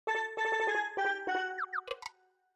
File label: MIDI Gag